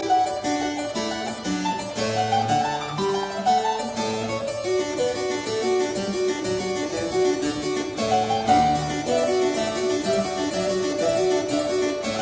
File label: Keyboard Sonata in G major